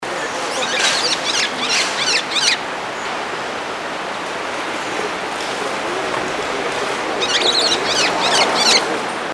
sunda cuckooshrike

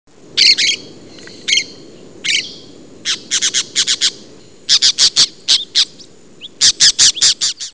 orange chinned parakeet

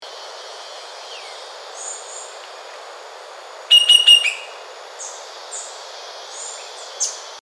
cinnamon woodpecker

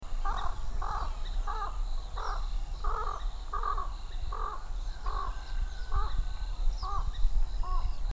chinese pond heron

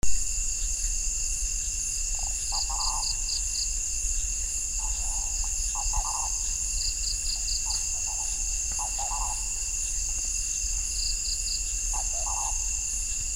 togian hawk owl

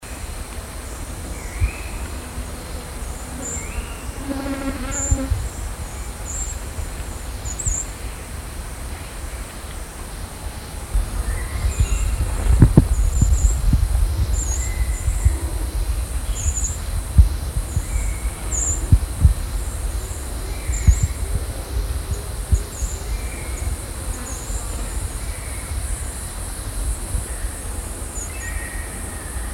finschs pygmy parrot

